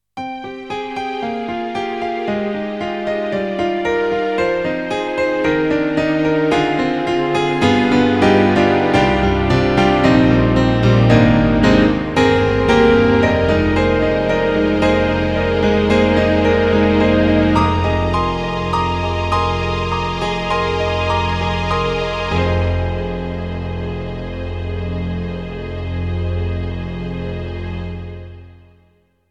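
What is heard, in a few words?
Korg N1R Piano and Strings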